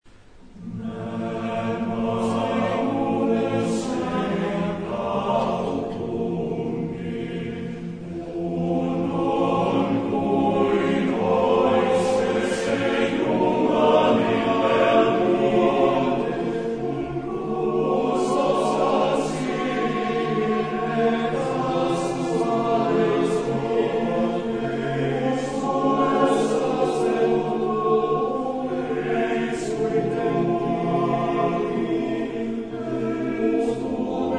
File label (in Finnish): (male), chorus; Madetoja; Valkeat kaupungit